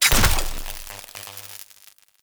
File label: Tripwire Activated; Traps